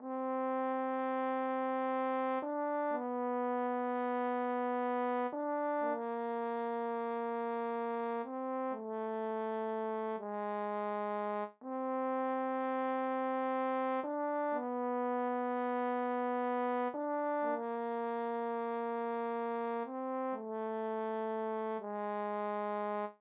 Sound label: french horn